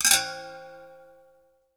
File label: Kitchenette, Drum & Bass Hits & Bits; Total Drum&Bass; 90 s Sample CDs